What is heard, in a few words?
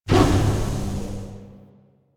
Teleports; Memory Of Betrayal; Warframe; Pax Duviricus